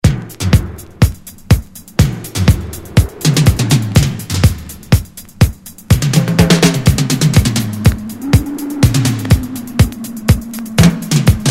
Sweet Drums

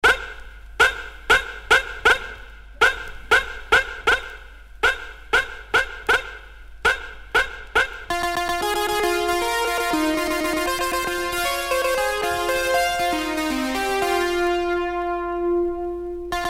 DISCO Rap Remix

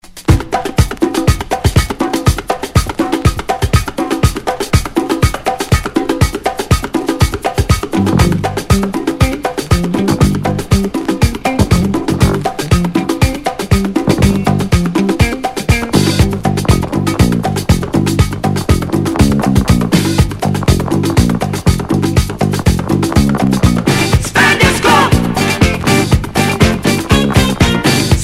Afro Cuban Blues Band

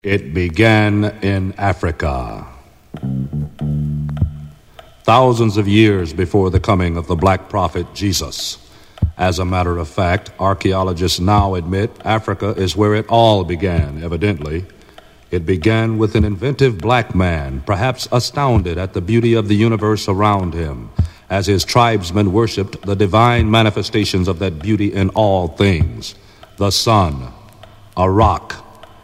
SOUL Drumbeat

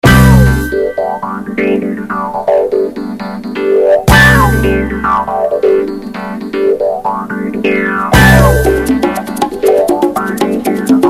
Disco

